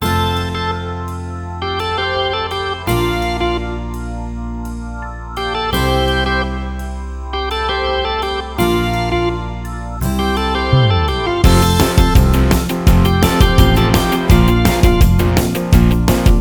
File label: Walk N Roll